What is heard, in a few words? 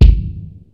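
HIPHOP KIT, DRUMS; Kid Nepro; Elite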